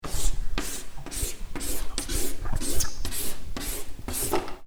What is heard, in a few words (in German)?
Kreide malen